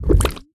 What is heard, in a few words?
jam sfx; Candy Crush Friends